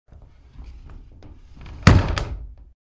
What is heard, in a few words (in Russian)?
razdvizhnaja variant